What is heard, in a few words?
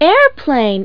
airplane; 単語絵カード * のりもの; ひこうき